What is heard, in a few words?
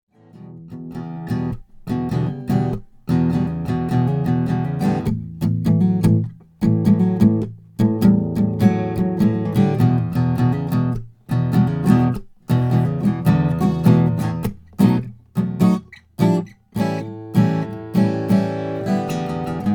Froggy Bottom L deluxe koa